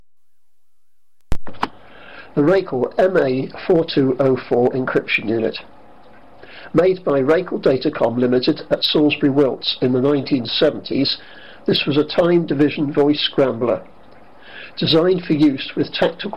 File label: Racal radio encryption